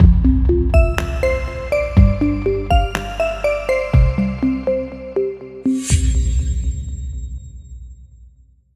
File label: Aqua Logo